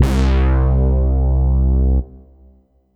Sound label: Yamaha EX5 Obersync C2